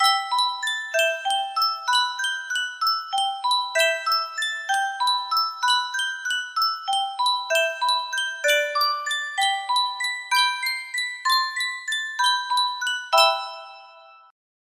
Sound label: sankyo music box, melody